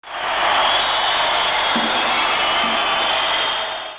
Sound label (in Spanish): FALLA